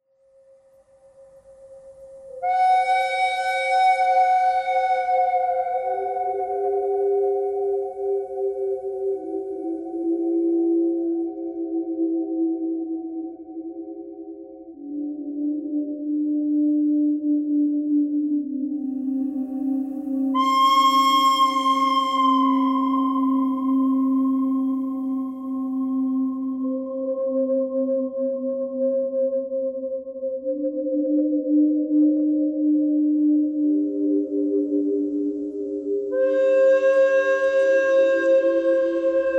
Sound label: birdtheme birdcry thread noise; birds